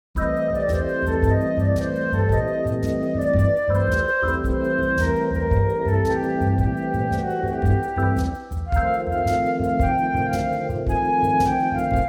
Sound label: MIDI blues